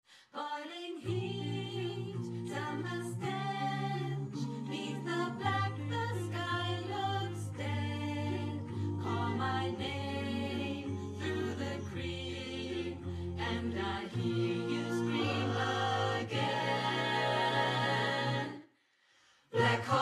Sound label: Remote Choir Verb Ambience Solo